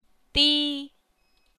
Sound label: tone